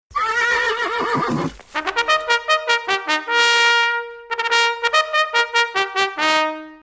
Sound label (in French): Sonnerie A Cheval